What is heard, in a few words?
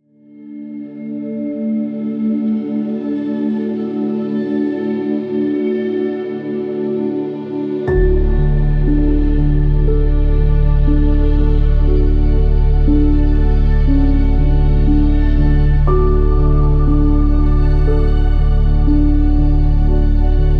Asian Zen Spa Music Meditation, Garden Relaxation